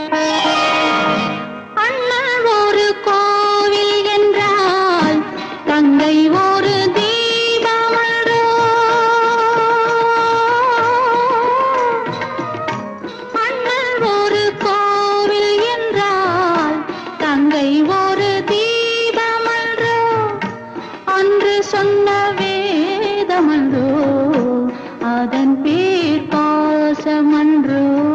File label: Tamil song